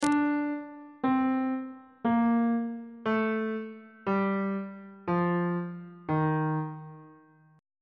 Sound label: d sharp lydian mode descending